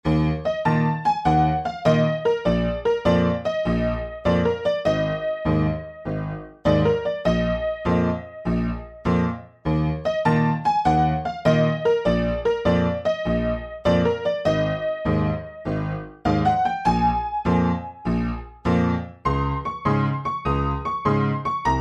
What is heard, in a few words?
Wired Piano; easyrpg; WitchsHeart